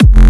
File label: Kick Bass F